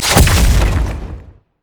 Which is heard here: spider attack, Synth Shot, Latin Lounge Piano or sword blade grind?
spider attack